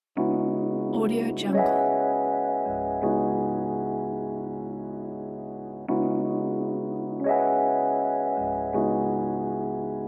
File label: drum and bass